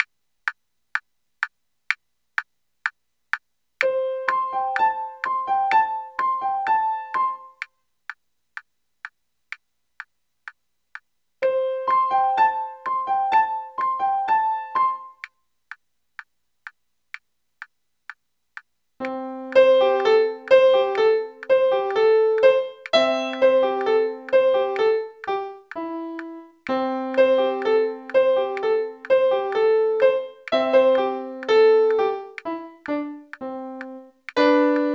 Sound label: primo